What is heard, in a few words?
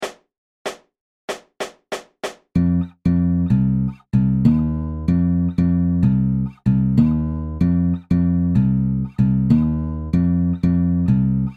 bass